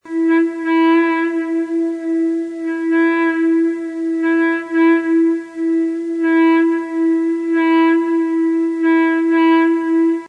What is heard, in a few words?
electric ebow E